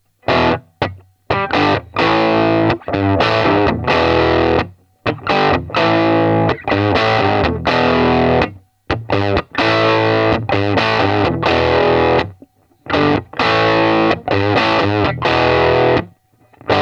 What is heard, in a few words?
Crunch